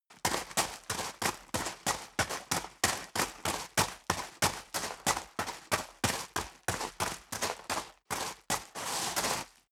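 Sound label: step boots gravel medium run sequence, footsteps; schritte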